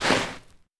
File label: Snow